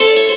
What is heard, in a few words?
guitar